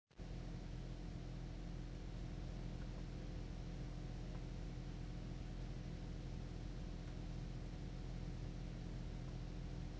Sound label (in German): Stufe 1 (loud), tesla